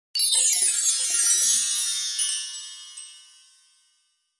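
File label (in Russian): перезвон блаженства или блеска; Перезвон колокольчиков создаёт волшебные звуки; Взмах волшебной палочки, сказочные звуки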